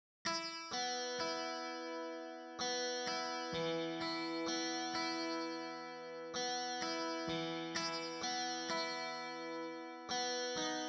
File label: E-Guitar; Beethoven; プロの音プロの技・令和版